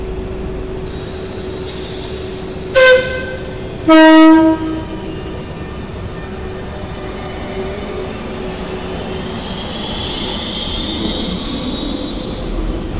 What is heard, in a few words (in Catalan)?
Sortida xiulet